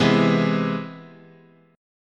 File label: C Augmented Major 7th, chord